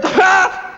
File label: Ambiences, Places and Ambience